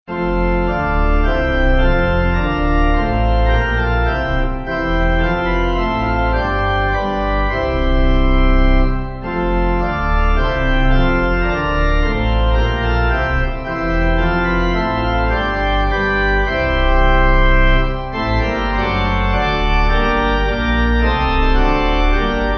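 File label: Pipe, Pipe Organ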